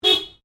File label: CAR SUBARU LIBERTY HORN SHORT; Family members Subaru; Vehicles; SoundEffects-Foundation1